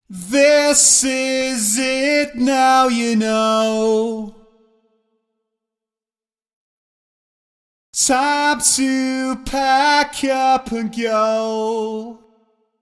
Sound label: processed vocal